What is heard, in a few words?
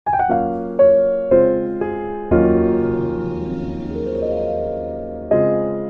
triumphant fanfare; Music